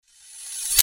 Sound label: Sword Sound; FXHome; Fighting Sounds